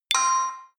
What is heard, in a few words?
notification signal